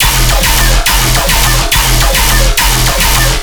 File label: Bass Loop E 140BPM, Dubstep